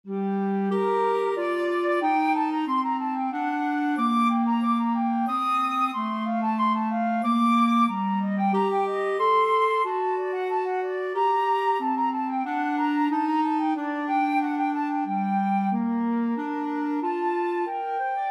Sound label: rondeau, flute clarinet duet; purcell